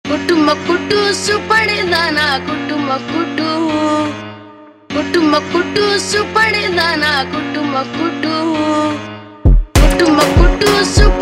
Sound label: Funk super Slowed